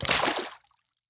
water walk; skyrim

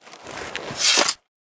blade 1 hand sheathe; equip

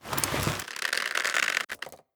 bow pull; skyrim

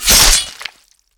kill slash

